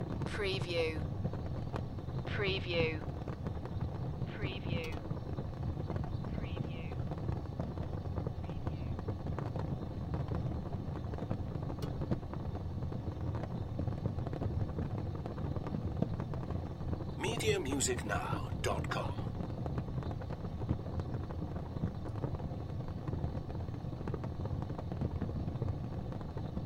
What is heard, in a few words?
Gas Fireplace Loop